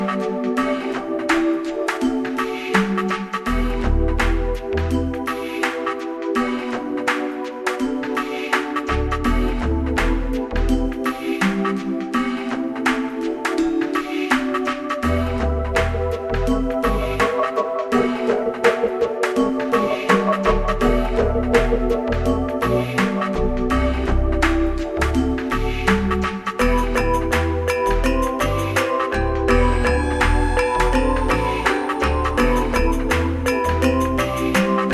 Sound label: bali relaxation lounge